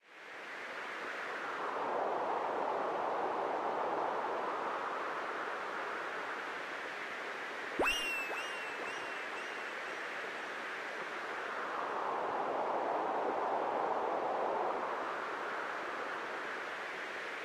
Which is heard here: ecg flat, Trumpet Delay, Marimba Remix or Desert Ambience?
Desert Ambience